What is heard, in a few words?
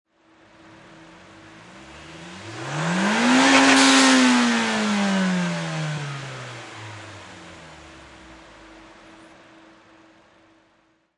Nissan Silvia Engine Single Rev; Auto, Power Car, High Performance Car Sound: Nissan Silvia. Engine perspective. Single motor rev 02.